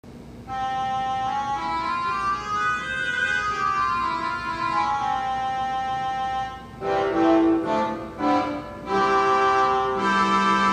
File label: Oboe, theatre organ